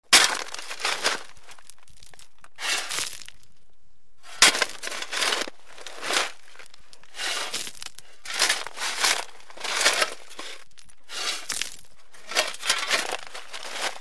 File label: Shovel Dig Gravel Dig